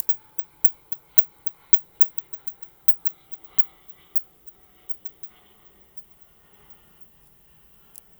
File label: Noctule